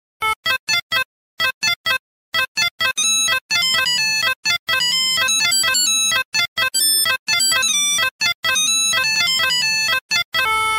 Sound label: duck, nokia 3310; nada dering; infinix